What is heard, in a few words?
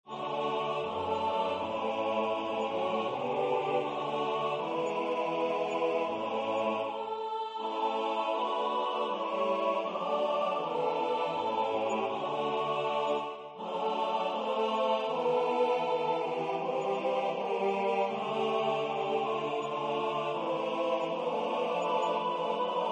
SATB; Waldesnacht